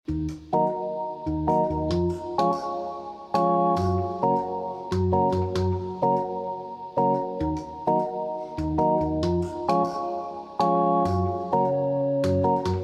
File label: sms sound